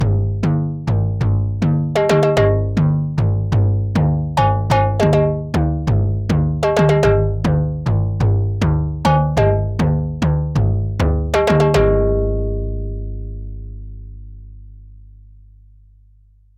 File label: Bell; Fell Bell 1 f